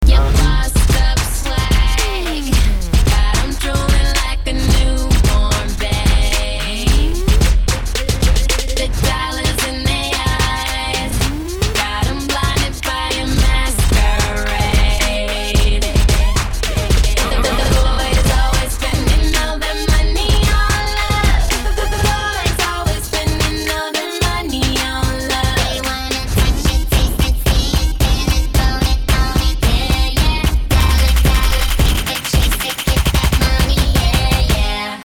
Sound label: funk hiphop